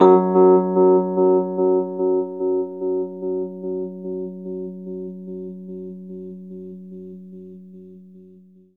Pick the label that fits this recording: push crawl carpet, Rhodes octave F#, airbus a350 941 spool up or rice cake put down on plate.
Rhodes octave F#